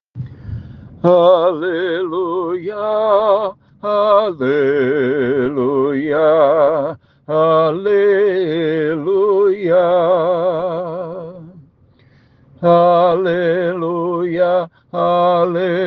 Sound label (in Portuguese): aleluia homens musica inteira; coral sao francisco; missa comemoracao sagrado coracao de jesus